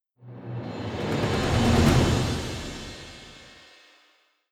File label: Vampires Bossfight Intro; The Witcher 3 Wild Hunt; Toussaint